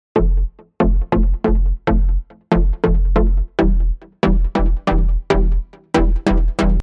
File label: mit, bass